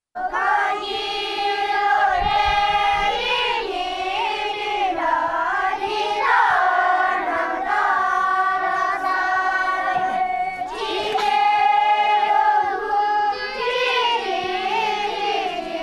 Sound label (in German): Schulapell Kindergesang, Ladakh